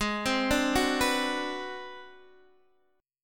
Ab 7th Sharp 9th Flat 5th Guitar Open D, chord

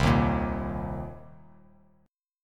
C Minor 7th, Bass Standard; strummed; JGuitar

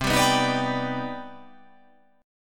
C Minor Major 9th C

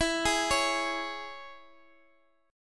E 6th, down, Guitar, Open D

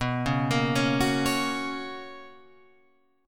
B Major 7th Suspended 2nd Guitar Open F, chord; BM7sus2; arpeggiated; JGuitar

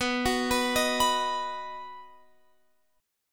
B-Major B down Guitar Open F; B arpeggiated; chord shape; JGuitar

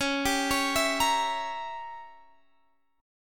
C sharp Diminished 7th C sharp

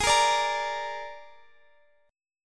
Ab Suspended 2nd Flat 5th Ab Guitar Drop D

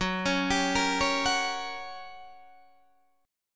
G Minor Major 7th Flat 5th F sharp

